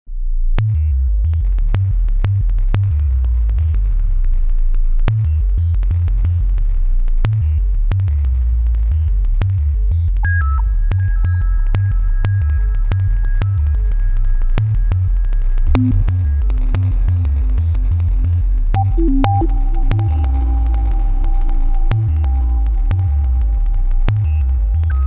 kawai k3 sounds, random resonances external fx; digital waves into analogue filter

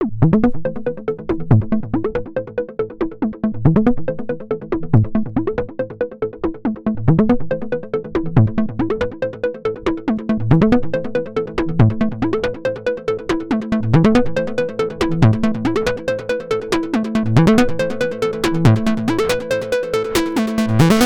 roland juno-106 sounds, arp beat external fx

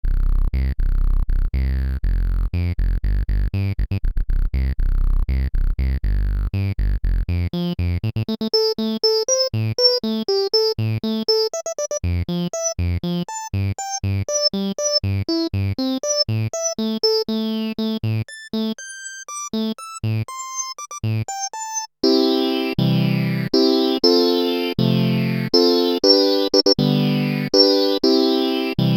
e-mu virtuoso 2000 filter eq 12pole q100

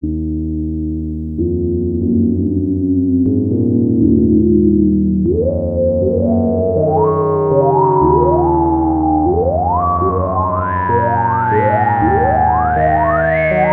ensoniq mirage dsk-8 sounds, soft

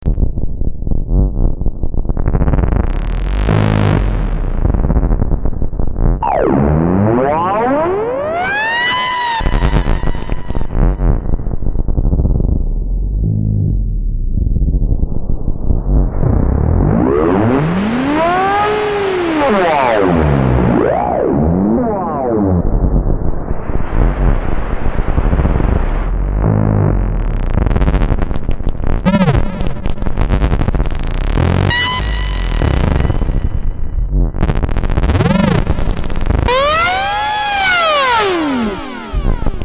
e-phonic invader vsti sounds external fx

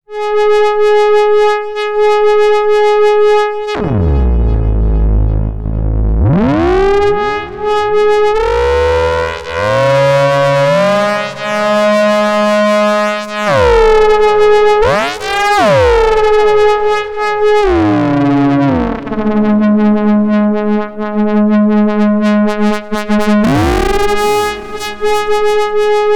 doepfer ms-404 sounds lead

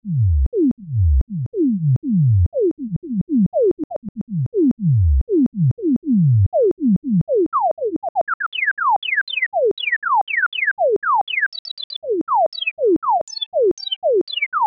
novation v-station vsti filter demo lpf24

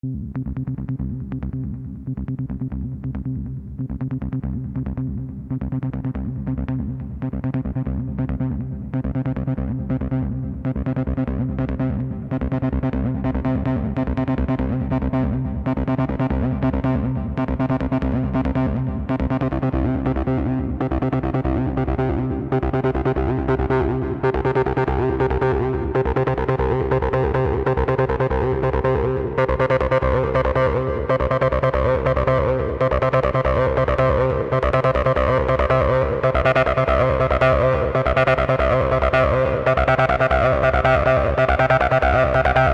yamaha an1x 303 acid; TB: Yamaha AN1x

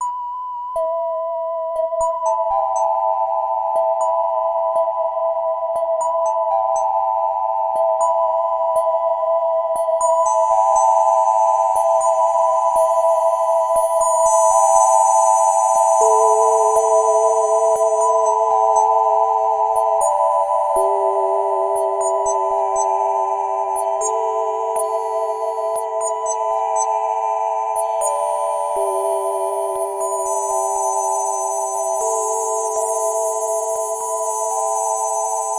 ensoniq sq-80 sounds, dream pad

